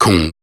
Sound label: Syllables and Imaginary Words, Viking Vocal, Hard